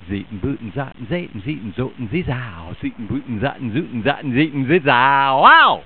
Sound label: skat fanfare